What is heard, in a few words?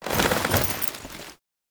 Comfort Foley Stand